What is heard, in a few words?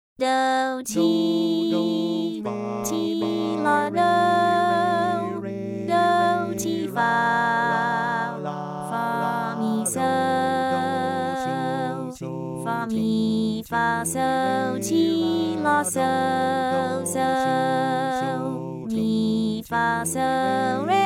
trio F 160